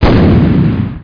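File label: sound explosion; MOTS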